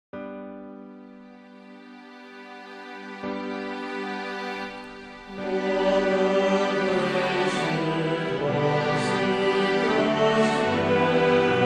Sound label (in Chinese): 谢饭歌, 赞美诗歌; 红本新编赞美诗